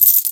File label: cash; flipout